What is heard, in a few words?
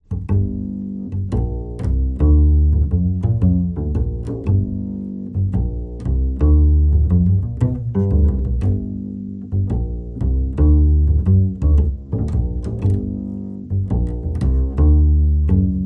Tango and the Ceasefire Waltz Duet